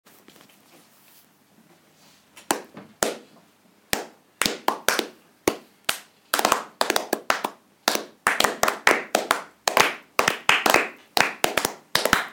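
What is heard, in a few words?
clapping piece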